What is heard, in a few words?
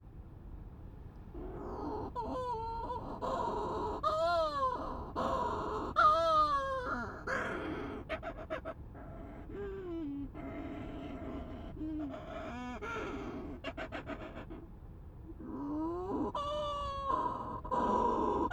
Menorcan shearwater